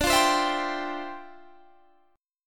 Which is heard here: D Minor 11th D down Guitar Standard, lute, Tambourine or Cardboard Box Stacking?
D Minor 11th D down Guitar Standard